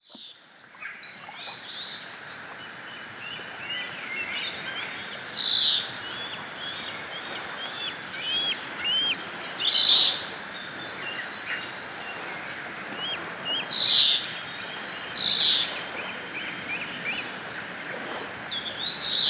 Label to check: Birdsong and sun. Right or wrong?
Right